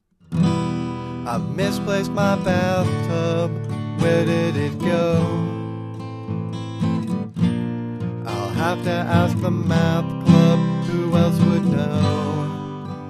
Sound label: Blues